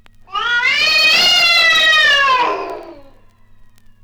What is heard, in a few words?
a cat howl desperate; horror